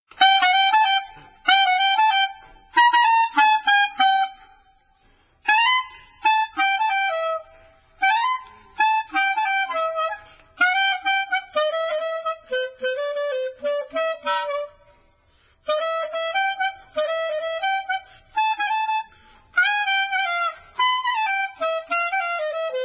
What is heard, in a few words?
Flute Duet, When The Saints Go Marching In; Instruments; Zomboid; Lifestyle